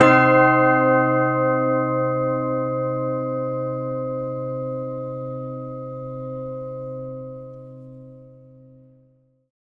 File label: C bell; berlioz